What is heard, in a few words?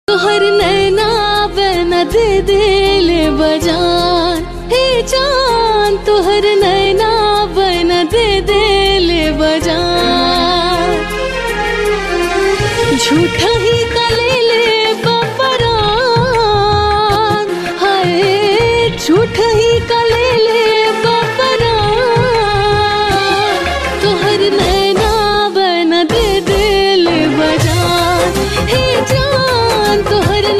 Bhojpuri Song